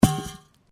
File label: METAL POLES CRASH; Metal (Sound Effects:Materials)